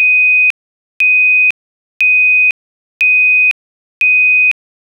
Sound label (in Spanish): tono